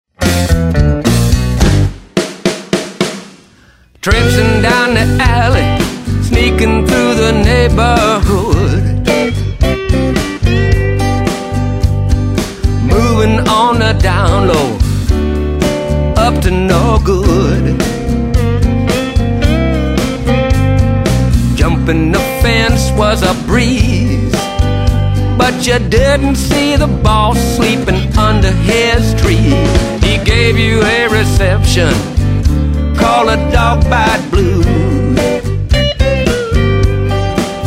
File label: Blues